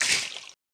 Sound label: splash